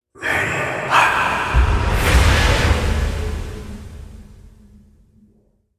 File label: Break In Scream; Ninja Tracks